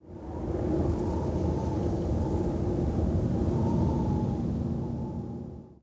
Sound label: WIND, wind